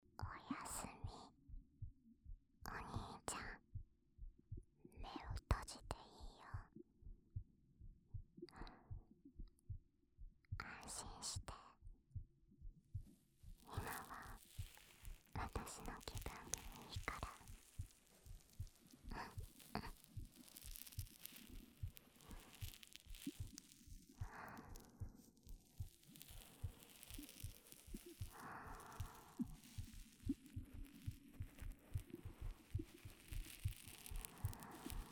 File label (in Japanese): 耳かき＋水の音, ループ用; おすすめお休みトラック